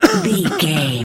voice male coughing

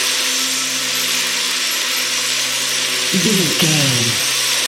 shimmer magic burn loop

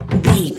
door old wooden close thud

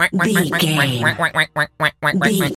duck quack seq long

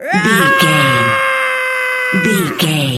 voice female battle shout

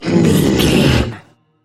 troll monster effort struggle

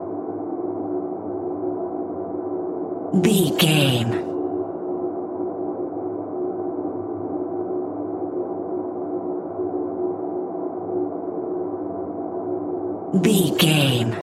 sci-fi spaceship background room tone hum loop

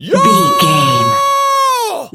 voice male c battle shout

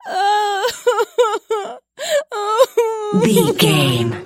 voice female cry

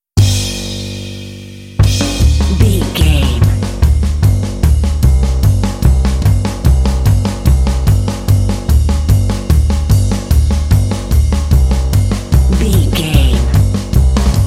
polka crazy fun hour

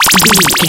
retro powerup item

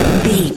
retro explosion general

